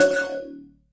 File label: cyborg Tea Cup; UNUSUAL KITS and FX